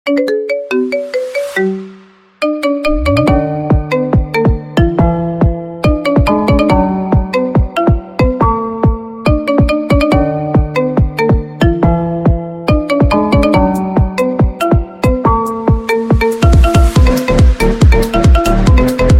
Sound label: iPhone Marimba Remix